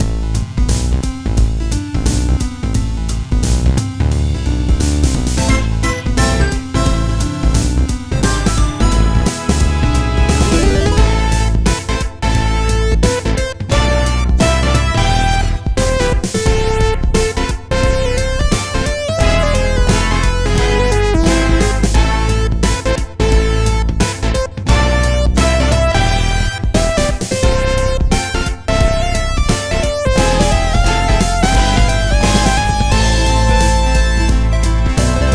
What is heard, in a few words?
Jazzy Main Theme; fat mask